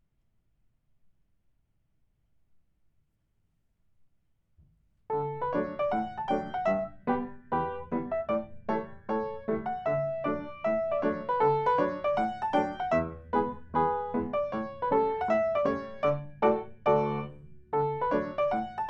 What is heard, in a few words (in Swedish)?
schottis; riddarhyttan